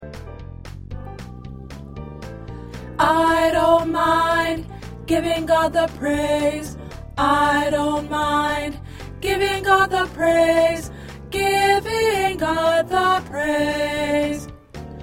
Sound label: Alto